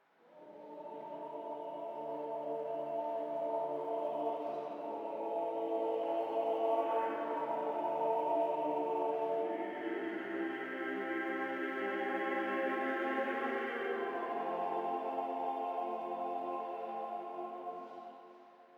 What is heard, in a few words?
Choir